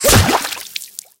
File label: bullet hit water